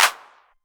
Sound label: Clap, Novation Drumstation